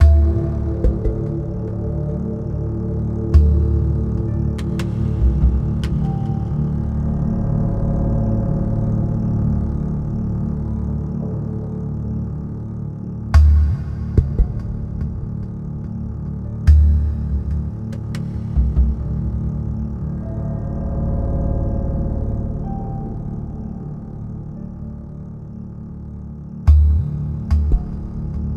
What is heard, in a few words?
Amb Indoor; The Hobbit; PS2